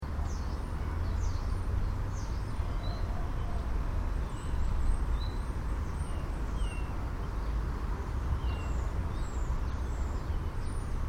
Park (In Town) Ambience: Birds, Road Noise In Background; Exterior town park; Outdoor; Stuart Duffield